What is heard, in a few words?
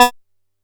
B4, Bass; E-MU Producer Series Vol. 6 – Analogia Project; Boom da Bass